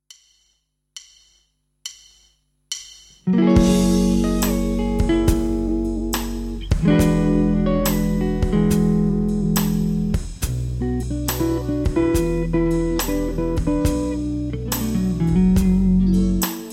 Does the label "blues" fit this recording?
Yes